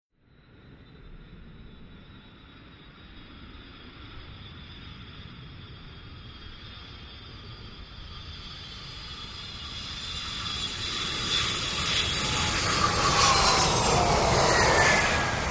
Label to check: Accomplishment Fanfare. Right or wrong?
Wrong